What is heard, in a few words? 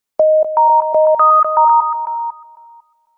Nice short tone